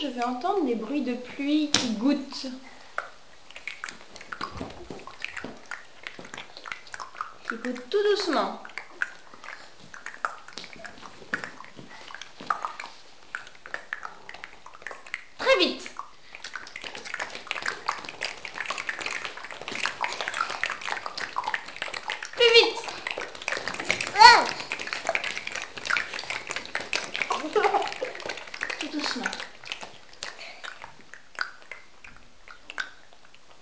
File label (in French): gouttes de pluie avec les bouches